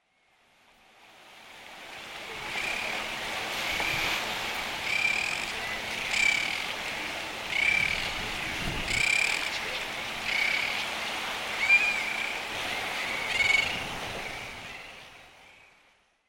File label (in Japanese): Mamijiroajisashi; Taketomi